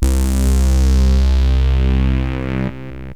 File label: syn, loops synth